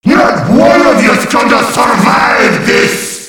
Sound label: laugh evil